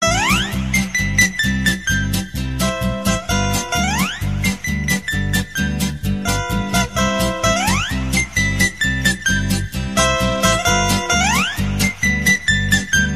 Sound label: polka